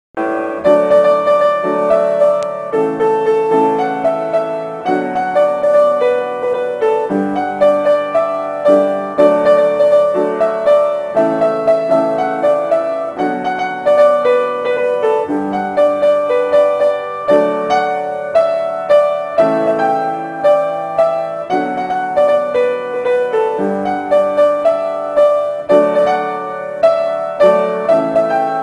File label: Piano